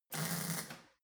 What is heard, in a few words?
weld sizzle short soft